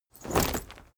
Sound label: leather armor movement